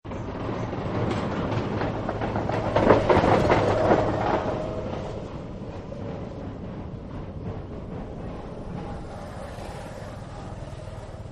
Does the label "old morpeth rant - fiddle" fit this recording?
No